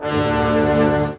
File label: Horns